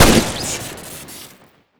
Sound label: tommy shoot crit; tf2classic